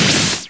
BALLON

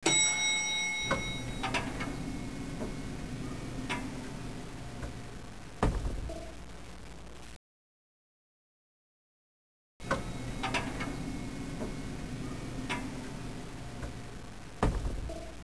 elevate, buttons

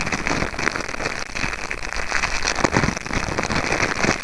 burning; NETSTORM

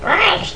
little monster; Sword Dream; Nile Trial v1.5

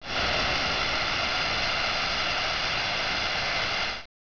steam

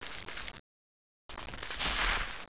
tree fall; 12000 Graphics Pack - A Complete Graphics Collection For Your PC

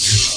Player Damaged; PC Gamer IT CD 32; SWARM

